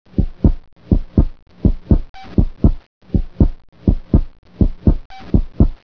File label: xenome heartbeat